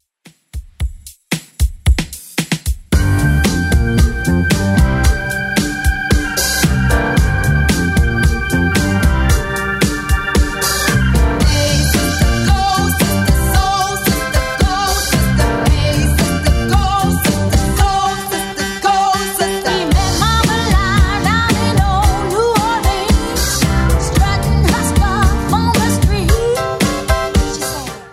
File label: 70s Redrum 113bpm